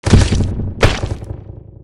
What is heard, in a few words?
sand king death; dota